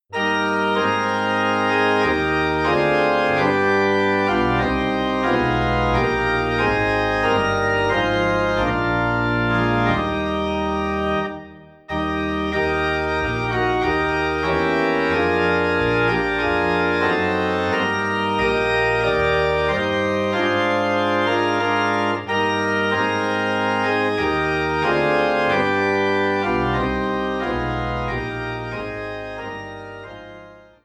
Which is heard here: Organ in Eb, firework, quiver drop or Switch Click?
Organ in Eb